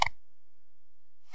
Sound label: Lightswitch